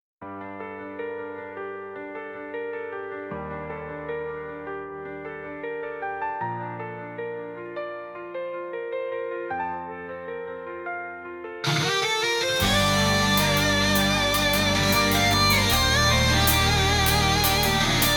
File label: opera rock, instrumental